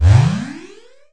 puzzle blink; ragemap2019; giegue

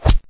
player pain; mistake coop

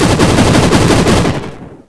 explo; svencontra2

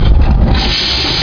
air door; svencoop; quake1

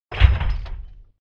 locked electro; svencoop; lostmaze

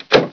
switch, buttons; svencoop; quake1

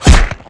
hit bod, weapons; svencoop; legends

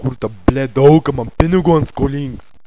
zo idle, zombie; svencoop; cracklife

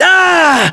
die, aslave; svencoop; cracklife

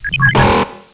basetry, doors; svencoop; quake1

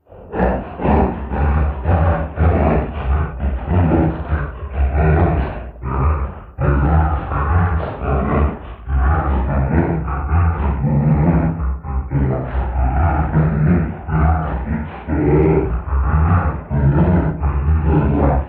monster moaning; svencoop; lostmaze

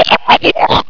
swim; svencoop; cracklife

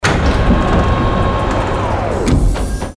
robo arm; svencontra2; stage2

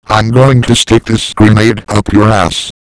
throw; svencoop; cracklife; hgrunt

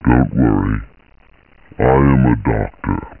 bite; uboa; ichyhakase

